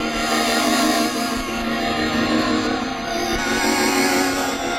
Giddy Strings; Sonic Foundry (Sony Creative Software); Myths of Technology

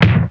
Kick, Fairlight CMI III

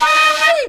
FLU + VOC, FLUTE + VOCAL; Best Service; World Colours

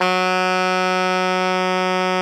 alto FF F#2, saxophones; Greytsounds; Philip Wolfe David Crozier's Saxophones

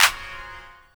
CLAP L, Drum & Bass 170 BPM; Ueberschall; Drum & Bass Flash Trackz

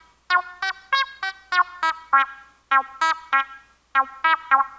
Bleep Synth; Sonic Foundry (Sony Creative Software); Stylus Pressure Urban Grooves on Digital Wax; Bleep Out

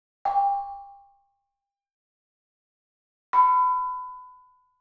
Gamelan; Propeller Island - Complete Gamelan

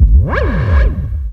fx scifi; Ueberschall; Techno Trance Essentials

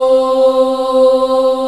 C3 CHILD, Choir; USB Soundscan vol.28; Acoustic & Synth; AKAI

